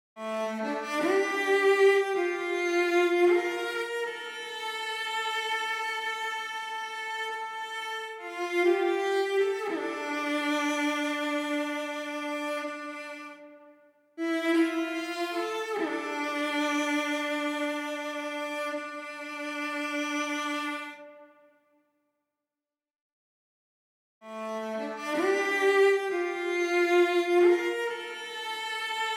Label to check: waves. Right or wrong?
Wrong